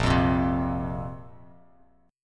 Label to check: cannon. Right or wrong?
Wrong